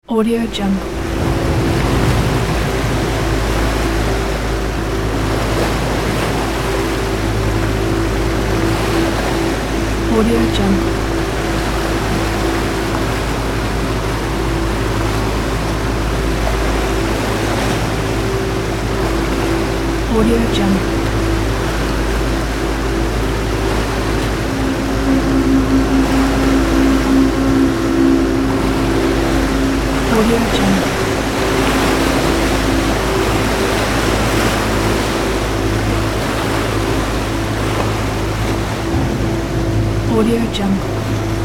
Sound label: passenger cruise ship lower deck ambience; sound-ideas